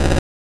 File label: Glitch Sound